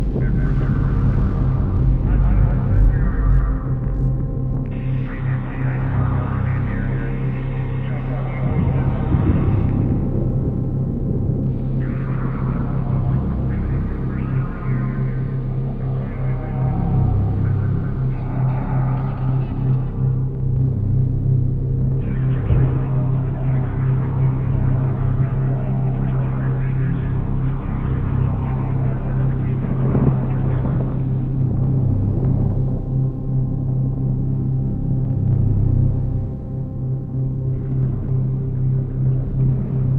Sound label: Main Drone; Battlefield 3